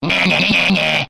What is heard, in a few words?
na na na na na; ooh ahh; human